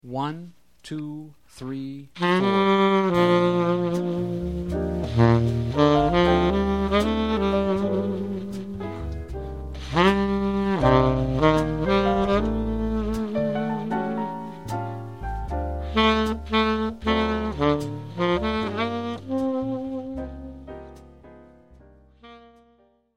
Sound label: subtone, sax